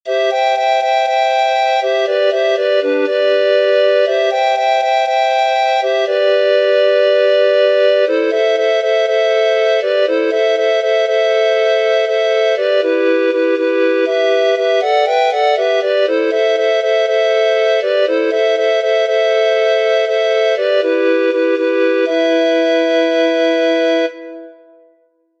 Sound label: 3 parts (2 harmonies)